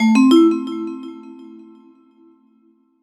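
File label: deep house FX, 125 bpm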